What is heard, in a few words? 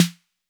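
Roland 808 snare drum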